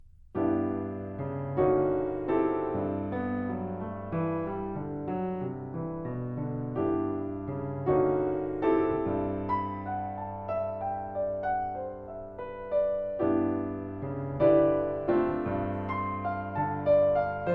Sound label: Suite For Horn And Piano, Slow And Sweet; Alec Wilder